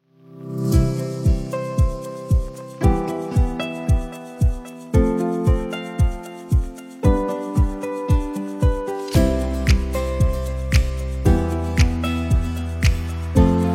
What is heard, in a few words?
Construction Corporate Loop; Stock Music; OSMusic